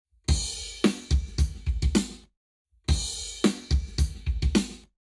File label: Lab Wet Drums 48 0 to 360 deg; eMagLS